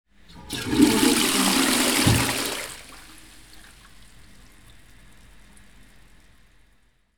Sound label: flushing toilet